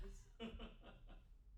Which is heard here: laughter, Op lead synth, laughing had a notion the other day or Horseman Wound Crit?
laughter